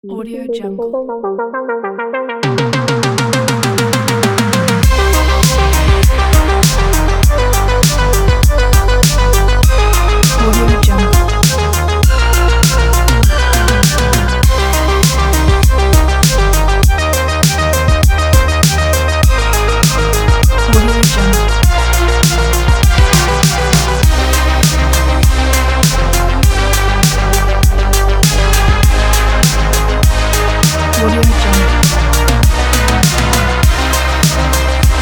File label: synthwave